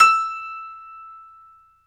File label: PNO, Piano Layer